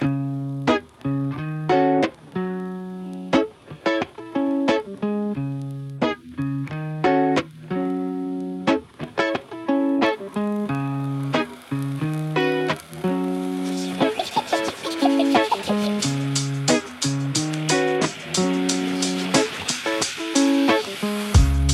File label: Instrumental; Golden Age 006; Studio rap